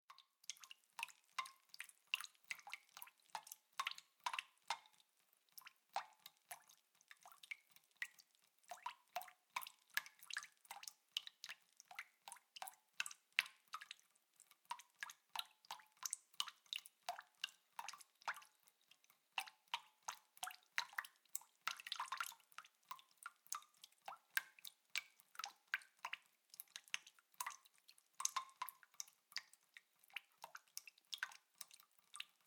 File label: Water Drips Into Bucket Leak; Kitchen; Household